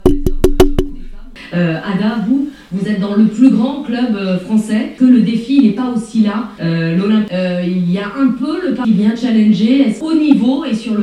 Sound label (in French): Jingle radio